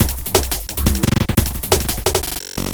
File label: Glitched Beat 175BPM; Beats; glitch kit